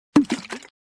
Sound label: Water Plop Single Small Object Dropped into Water